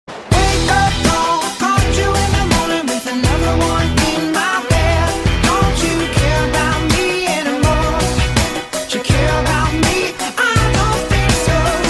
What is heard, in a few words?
wake up call